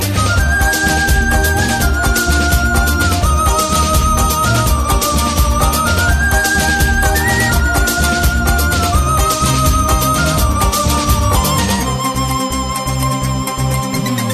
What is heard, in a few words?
romantic remix